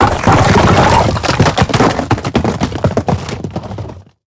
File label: building fall mid